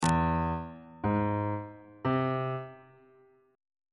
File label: e augmented triad chord intervals on bass clef